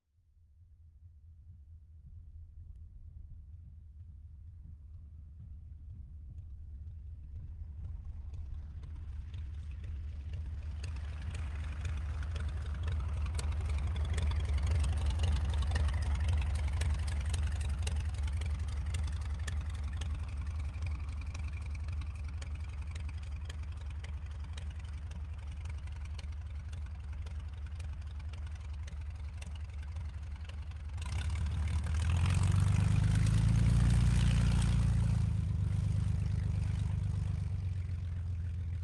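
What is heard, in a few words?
fokker d vii exterior slow taxi by; Sonniss GDC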